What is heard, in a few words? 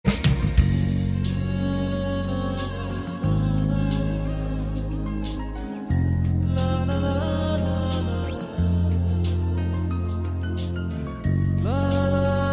ringtone bangla; alternative